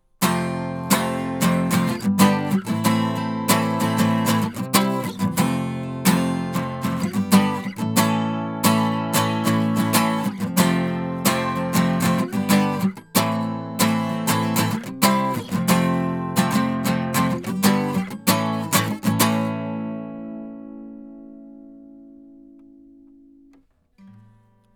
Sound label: guitar